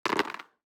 DICE on Cardboard, Throw and Roll, Standard, 6 Six Dice; Samples; ArticulatedSounds; DICE Sfx Party (Extended)